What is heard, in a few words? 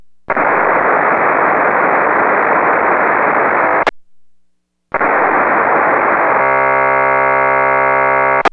P25 nontrunk, DSD decoder